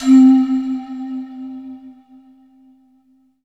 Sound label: SQ1, Synthesizers